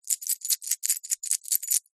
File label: leisure board game tokens metal handful shake hand; monopoly